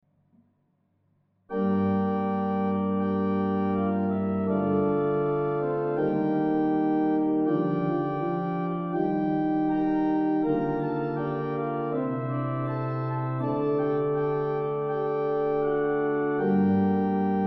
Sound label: Choral; Hofhaimer Pa; Ach edler Hort